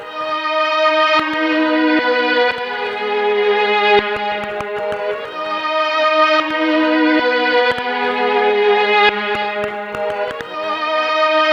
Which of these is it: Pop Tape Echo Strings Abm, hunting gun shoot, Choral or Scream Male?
Pop Tape Echo Strings Abm